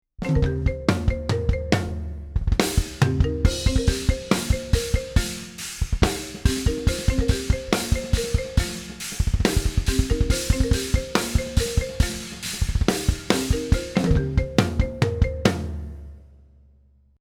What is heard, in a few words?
Opening Drums